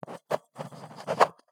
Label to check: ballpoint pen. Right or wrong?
Right